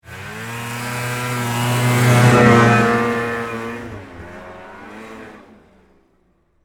Snowmobile By, Snowmobile, Pass, By, Close, Fast, Speed, Yamaha, Exciter, 440; Sports; BlastWave